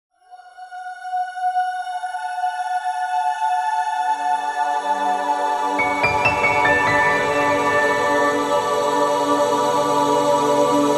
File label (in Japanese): お天気 天体 幻想的 Pad ピアノ